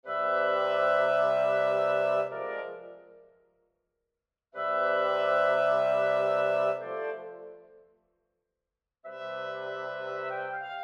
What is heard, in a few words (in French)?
petit quatuor pour bois; Casadesus Axel